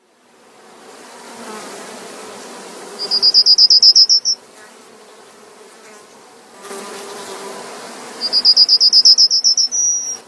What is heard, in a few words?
audio yellowhammer